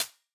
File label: hats C, hip-hop drum kit; 99Sounds x Monosounds; Moon